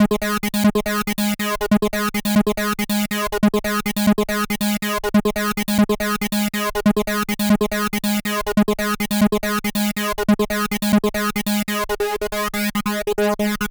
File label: Sol-7 G#; Vengeance Samples